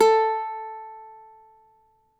CAVAQUINHO; Spectrasonics; Hans Zimmer Guitars VOL-2

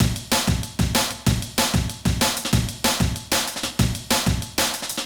another amen, breakcore breaks; DOA breaks

